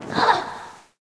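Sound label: bee damage, monster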